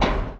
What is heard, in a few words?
reel Stop; eggomatic